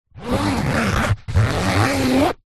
laptop case fabric pouch zipper; foley

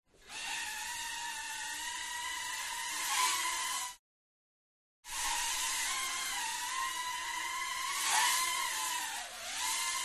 Drone; fox audio

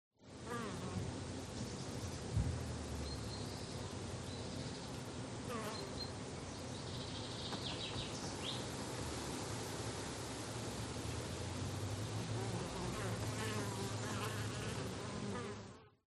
NATURE Countryside Single Bee; ATMO; smartsound

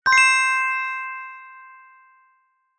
fantasy magic chime ping wand fairy godmother

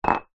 mug cup ceramic set down kitchen worktop; household

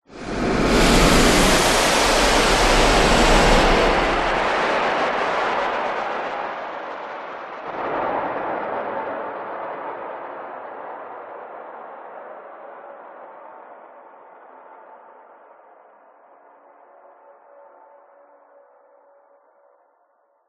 whoosh; orlando lanzini

